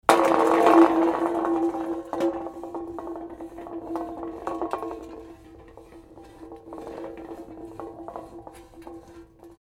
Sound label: paint tin falls rolls off